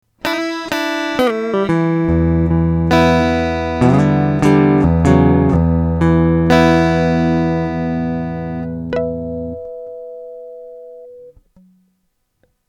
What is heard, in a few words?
passive Guitar; JD-XLABs X100; Jackson